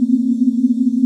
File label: Field Meter Loop; faradays electromagnetic lab